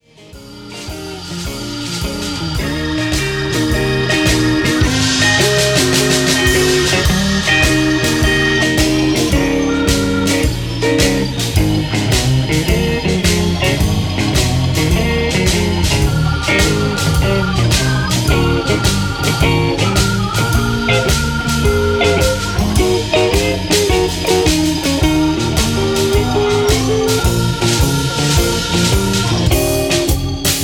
Chicago Blues in Eb

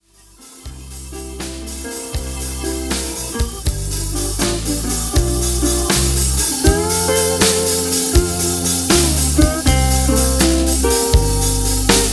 Delta Blues in C

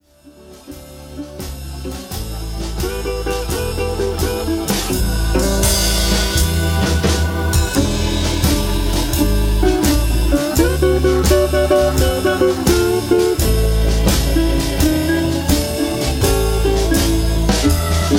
Delta Blues in A

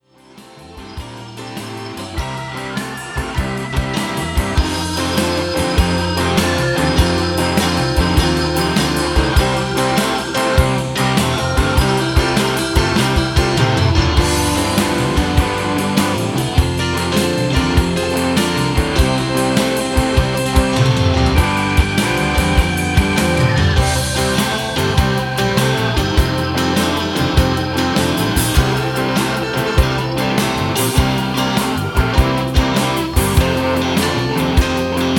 South Side Blues in C

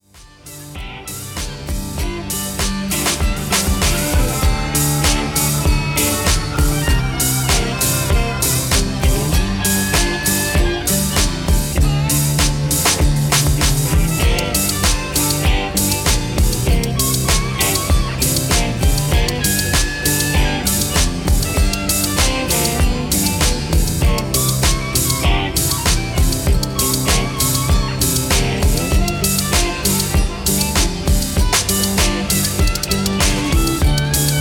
Techno Blues in G, trumpet

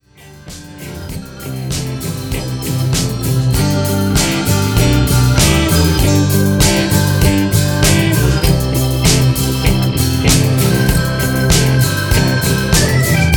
Disco Blues in G

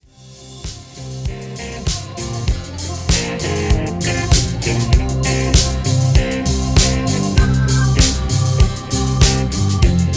Disco Blues in C

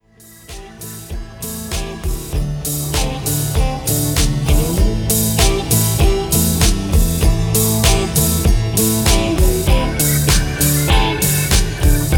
Techno Blues in A

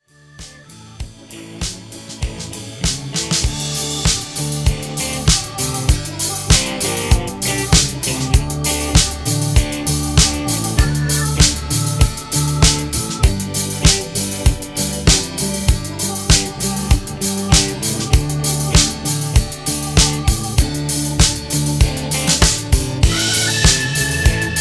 Disco Blues in D

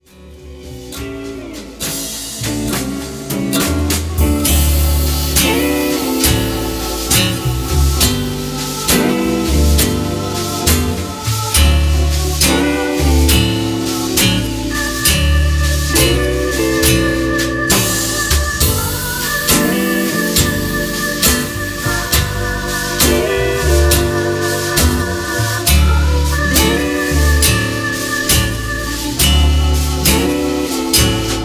Brushes Blues in D

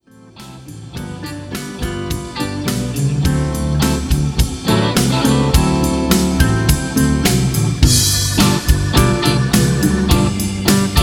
Down Home Southern in D

